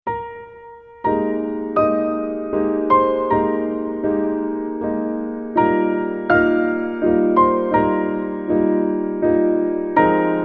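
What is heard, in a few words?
I Stand All Amazed, Piano Low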